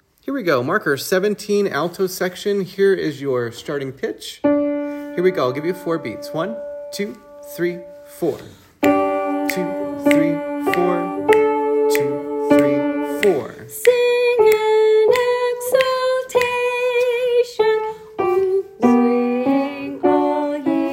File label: Alto, choir